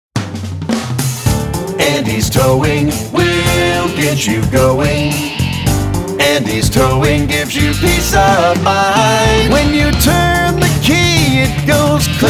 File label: Towing Full Sing; Andy's Towing; Jingle